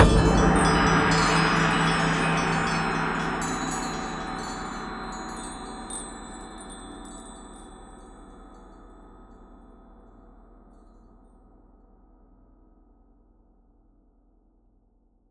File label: Win Loose B